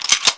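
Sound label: heavy machine gun slide, weapons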